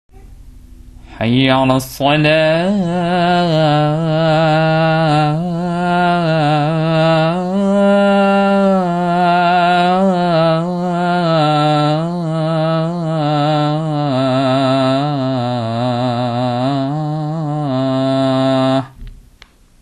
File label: Adzan